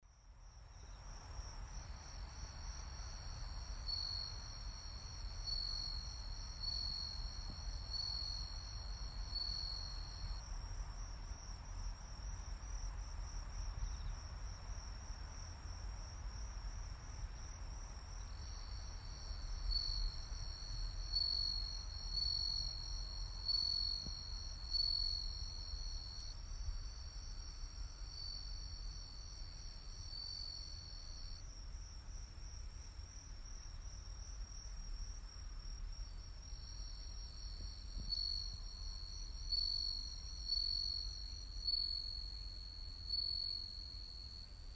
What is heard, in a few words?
crickets